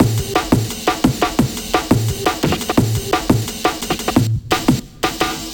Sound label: 173 Break, Loops; M8